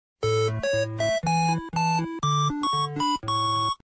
statefarm jingle

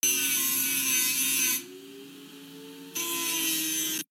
bzzzz

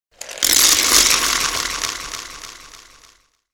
delayed power outage

